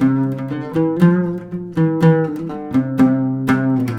120 LUTH 1, Arabian Traditions; USB Soundscan vol.43; AKAI

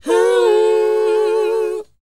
WHOA E, Vocal R&B & Dance; ILIO; Vocal Planet

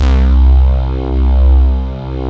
SIGH C2; Big Fish Audio; Synth City; COMPOSITE

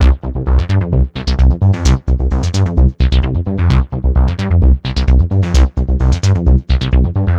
NRG Bass, Trance

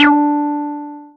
Synths BASS

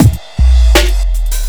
Speed Loop Cut time w Hum, Drums Beats

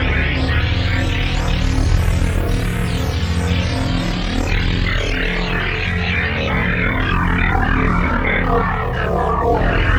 Static Arp; Sonic Foundry (Sony Creative Software); Ma Ja Le Ethereal Textures; Noise Percussion

